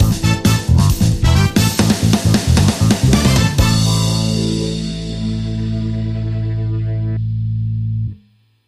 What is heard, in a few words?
Funky Soul Cats Stinger